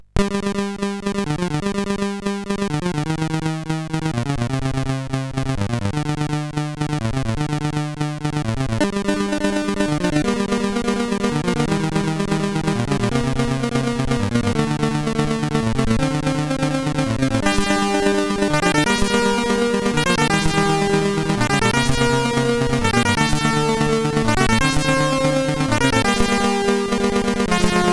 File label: Remix, CSG8580R5